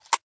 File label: TOZ shell; svencoop; hunger; weapons; dbarrel